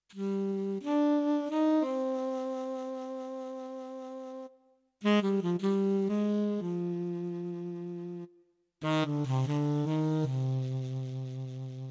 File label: Sax; CVP609